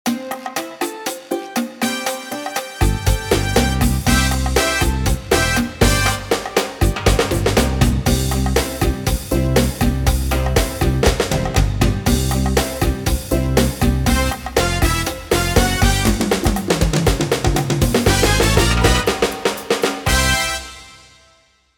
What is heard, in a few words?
Latin Pop